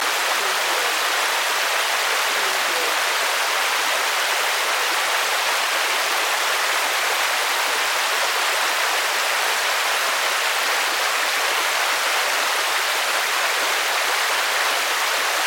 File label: Rain Lake Heavy